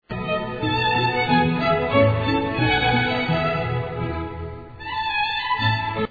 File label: minuet